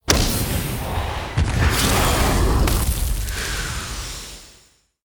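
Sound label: boss wolf watcher death, monster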